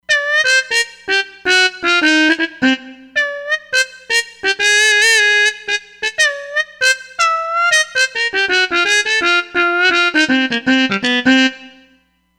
Harmon Mute

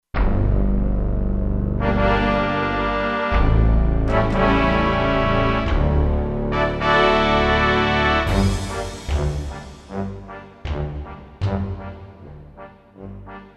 Touch Orchestra; Factory Programs

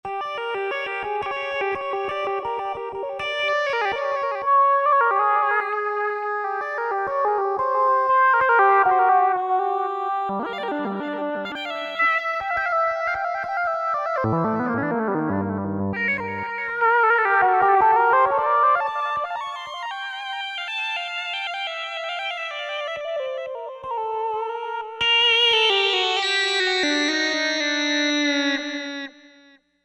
Espresso Lead, Korg TR-Rack

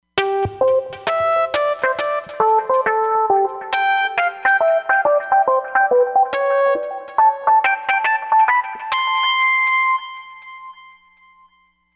Roland Fantom XR, Patch; Clone Zone

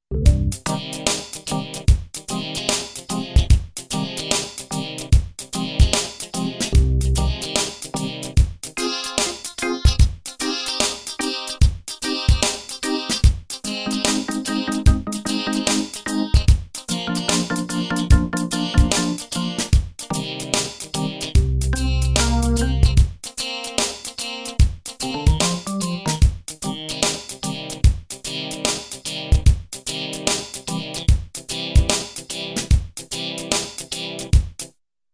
Snap Reggae Organ

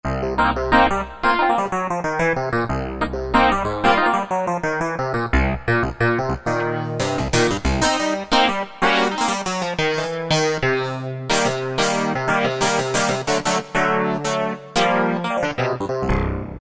KY Wahclav, Yamaha TG77; Factory Presets

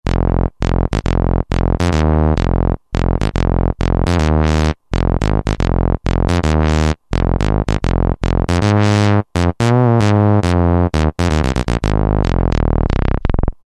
Bs, Roland SR-JV80-04 Vintage Synth; Odyssee Bs

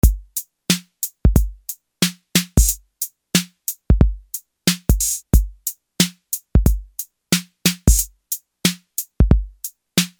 ROCK, Roland TR-808; Factory

